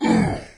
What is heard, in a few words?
pain; doom3; commando